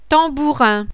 TAMBOURIN, musique À PERCUSSION

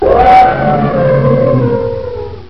ELEPHANT DE SAVANE; biome

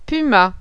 PUMA; HOMME PUMA

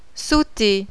sauter; CARNAVAL; loisir

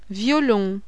VIOLON; LES SANGLOTS LONGS DES VIOLONS DE L'AUTOMNE

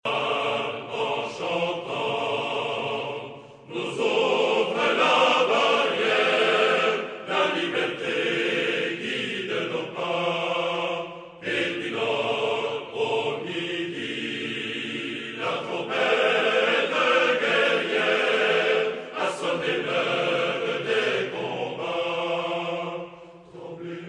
CHANT DU DEPART; histoire de france; RÉVOLUTION FRANÇAISE

